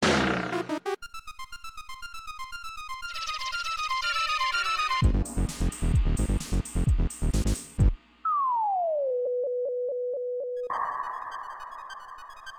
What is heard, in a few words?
You Lose